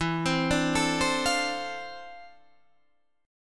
D-Minor 7th, E; Dm7/E; arpeggiated

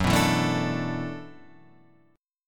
E 7th Suspended 2nd Suspended 4th, F sharp, chord

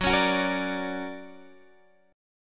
D Augmented Major 7th, chord

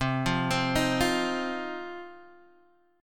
C Minor Double Flat 5th C down Guitar Open D

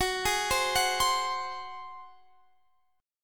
F sharp Suspended 2nd Flat 5th, Guitar, Standard; arpeggiated; JGuitar

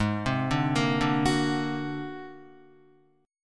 Ab 9th Flat 5th Ab down Guitar; Ab9b5 arpeggiated; JGuitar

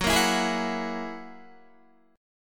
Gb 9th Gb down Guitar 4E,4A,5D,5G,5C,6F; Gb9 strummed

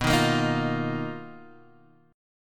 B Major Sharp 11th, Guitar, Half Step Down

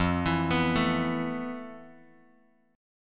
F Major Sharp 11th, Bass Standard; arpeggiated; chord; FM#11; JGuitar

